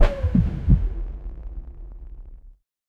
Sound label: primal critical faillure, tribal